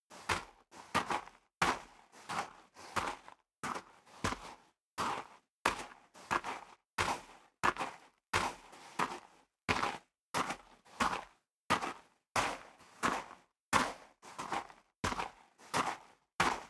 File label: step boots gravel light walk sequence; schritte